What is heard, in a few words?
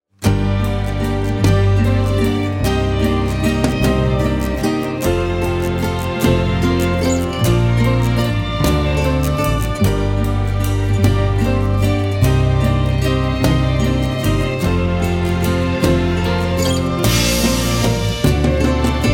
Instrumental